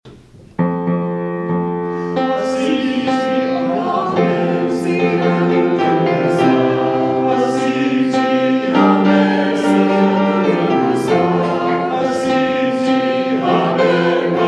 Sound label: Hymn; Asithi-Amen